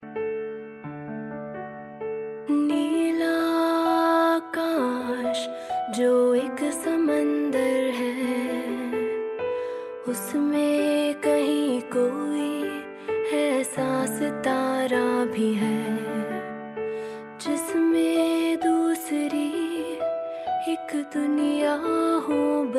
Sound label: ringtone